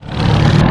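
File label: boat start; quake2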